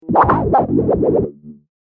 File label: Warped Water Plastic Warble; Water Sound Effects